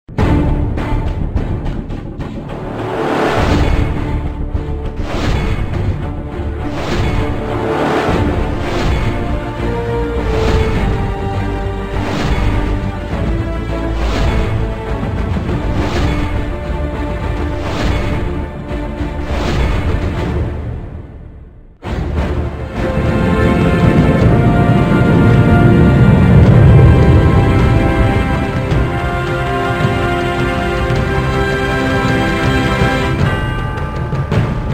豪迈的背景音乐

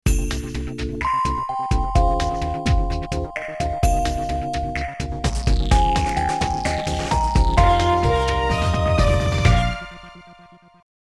片头音乐

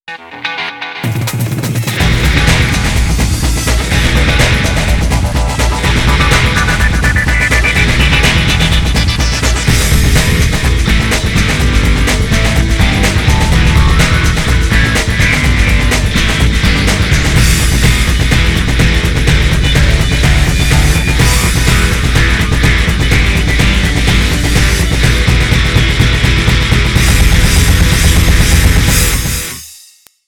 电视包装音乐

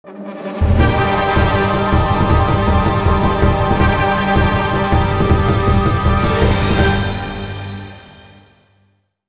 大气的背景音乐

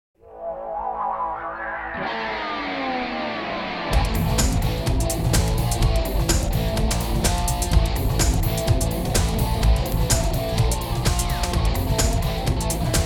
片头音乐

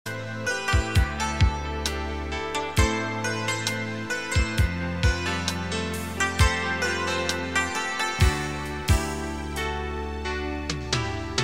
广告片音乐

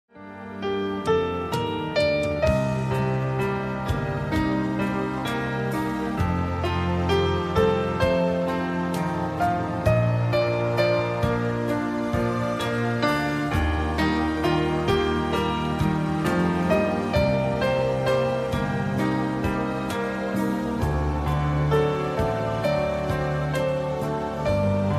彩铃背景音乐

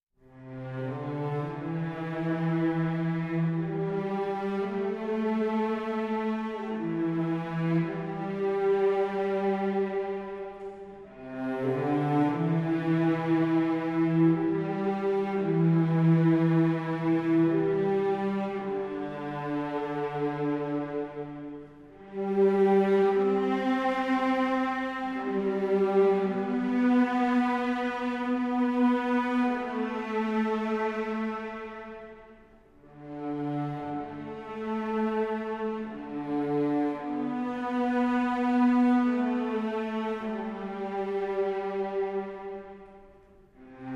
轻柔的背景音乐

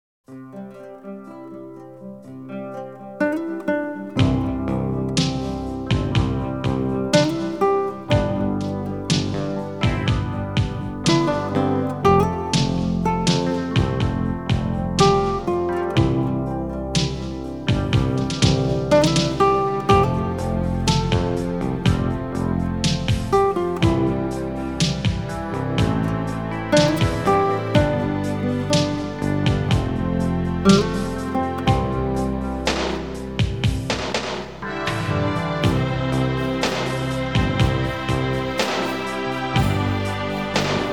纪录片背景音乐

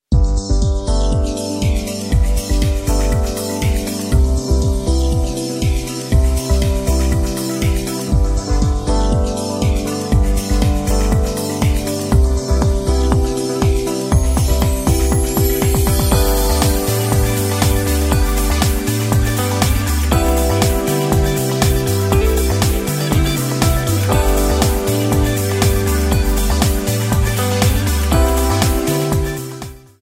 专题片背景音乐

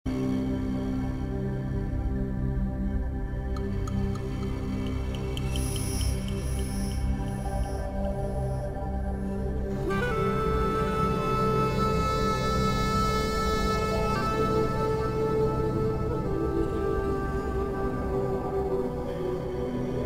专题片背景音乐

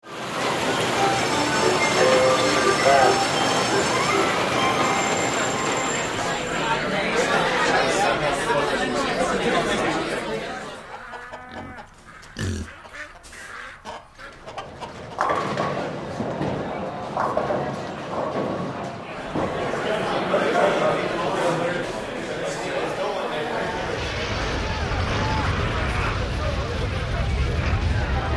片头音乐

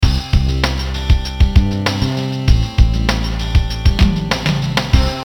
片头音乐

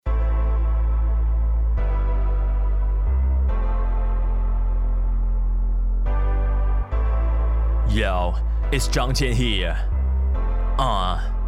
歌曲翻唱 一人分饰三角色

